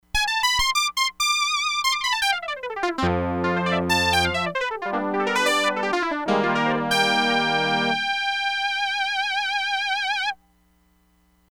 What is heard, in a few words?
LEAD (RECTANGUL, Crumar Bit 99; Factory Programs